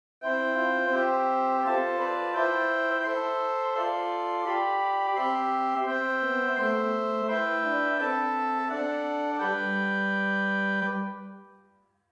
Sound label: Principal 4, organ; Hauptwerk; St Annes Moseley